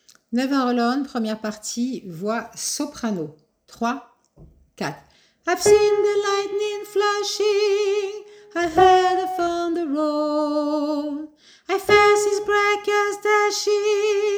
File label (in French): VOIX SOPRANO